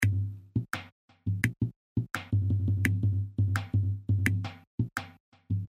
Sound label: LOOP